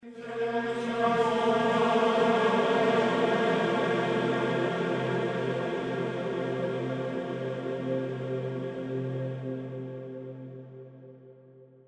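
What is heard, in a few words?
soundscape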